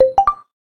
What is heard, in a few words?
Pebble, notifications; LG L-Fino